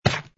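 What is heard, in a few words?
footstep stone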